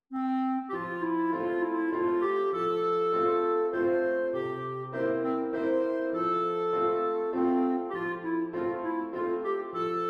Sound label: German Dance Clarinet; Allegro moderato; clarinet concerto; German Dance Mo.5 D. 420.5